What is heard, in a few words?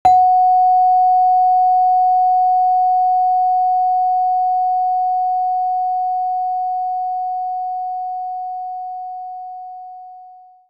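Celeste F#5 delay; knights try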